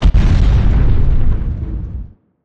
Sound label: explode dist; midnight guns